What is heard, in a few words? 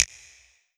Korg N1R Finger Snap